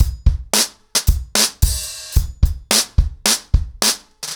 110BPM, ACOUSTIC DRUMS; The Roots Drumloops

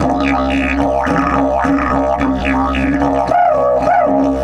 108, Didgeridoo

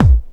kick dance, techno trance drums; Techno Trance Essentials

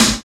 SNR, Cheez Snrs, Roland; Club-50 - Foundations Roland

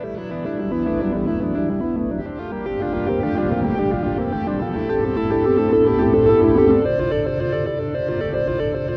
Arp, Guitartronics; Sonic Foundry (Sony Creative Software); Ma Ja Le Ethereal Textures

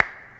CLAPS HIP; Best Service Dance Mega Drums

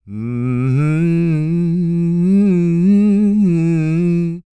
SING, Blues Soul; Spectrasonics; Vocal Planet

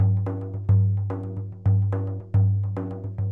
Sm Frame, World Percussion

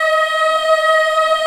D#4 Child EH, Choir; Acoustic & Synth; CHILD EHF; AKAI; USB Soundscan vol.28

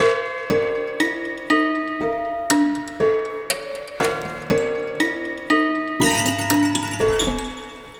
Prepared Piano Loop

